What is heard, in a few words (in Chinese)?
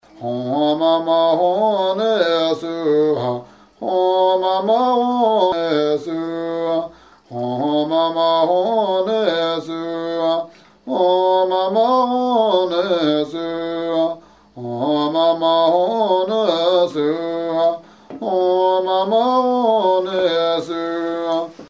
佛教音乐-大白伞盖佛母心咒